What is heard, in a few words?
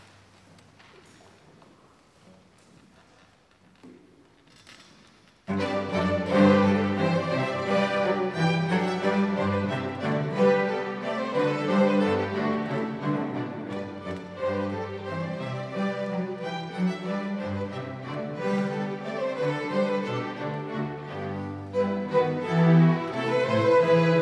Bach Gavotte